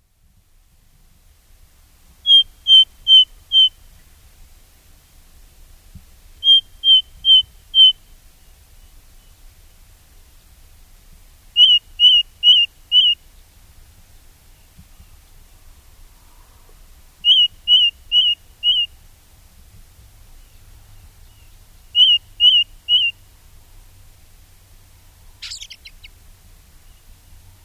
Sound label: Ring Ouzel